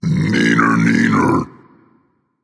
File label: tongue, voice; Smite; ymir skin14